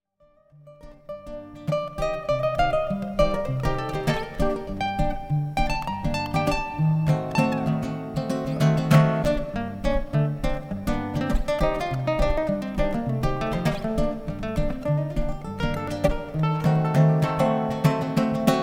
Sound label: Gypsy Jazzy